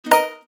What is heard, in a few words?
now, notifications; HTC One-X